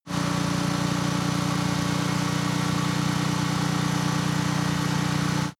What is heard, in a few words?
motorcycle engine medium low constant rpm ascending; motorraeder; verkehr transport